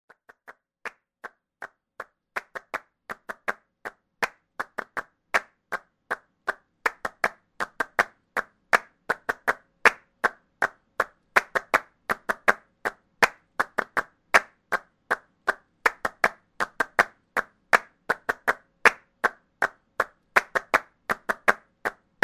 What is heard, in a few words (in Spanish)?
PALMAS